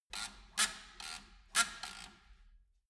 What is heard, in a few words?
nut runner wheel change